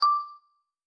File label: begin record; i OS; iOS10